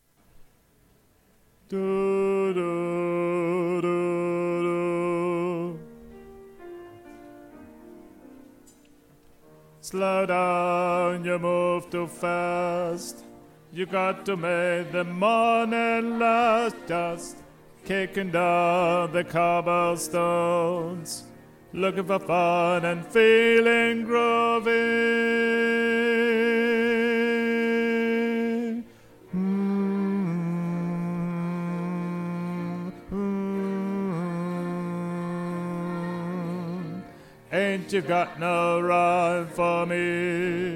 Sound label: tenor